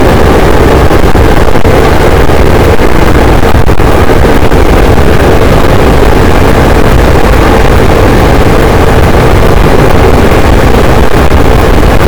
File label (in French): cachalot, boat; Physeter Macrocephalus